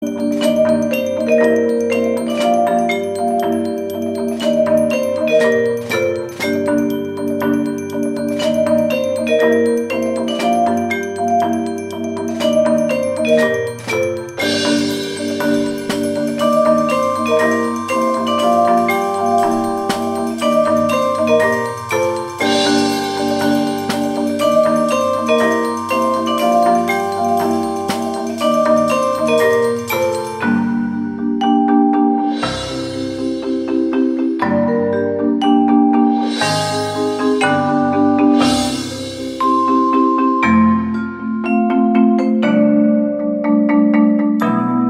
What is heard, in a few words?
Marimba; The Avengers